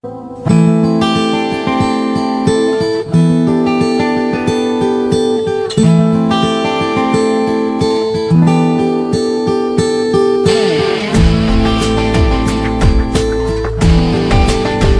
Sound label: Ringtone